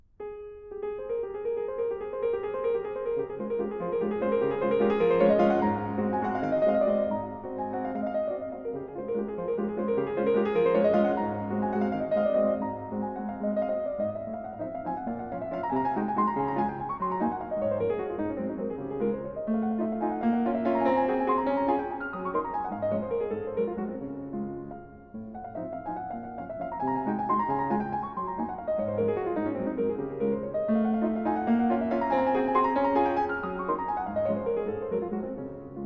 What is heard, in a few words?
Waltz #6 In D Flat, Op. 64_1; Chopin; Minute